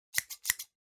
Scissors Snip Snap

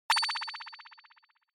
Onoma Surprise High Long

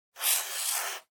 Pencil Circle

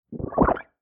Motion Swallow

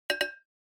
Onoma Surprise High

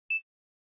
NES Racing

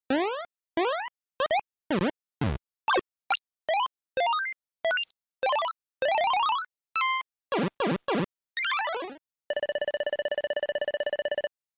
NES Action

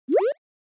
Motion Pop

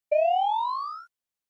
SNES Shooter Item

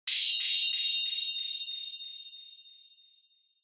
Flash High Delay; Onoma

